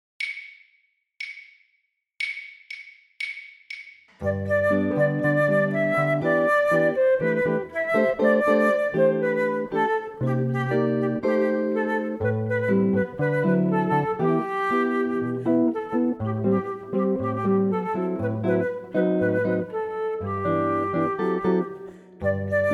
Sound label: 120 bpm